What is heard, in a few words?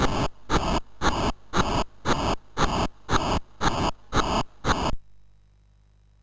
Final Synth Sample, MOS6581R3